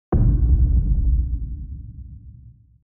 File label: Boom Impact Deep Distant; Оглушил; Звуки удара; Звуки удара для Форсакен